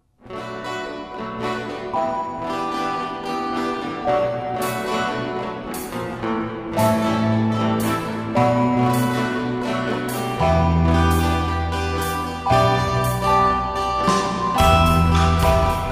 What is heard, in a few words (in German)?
e-moll